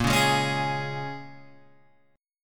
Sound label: A sharp Minor 7th, A sharp, down, Guitar, Open G; A#m7; strummed